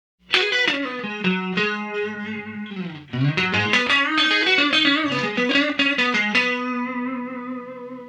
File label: Blues Guitar